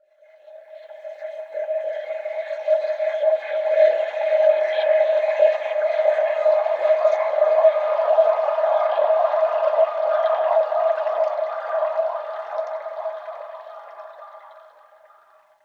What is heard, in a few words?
Water Stream, Chalice Well